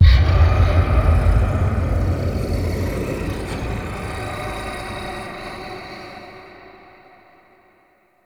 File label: Transmission Start; Warframe; Entrati Labs; Evil Twin